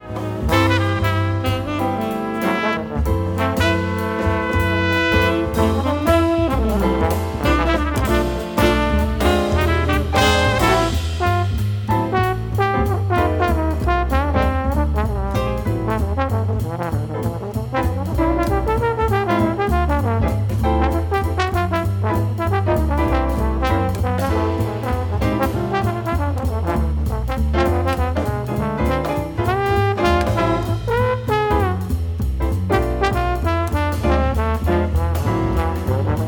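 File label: Cat Dreams trombone solo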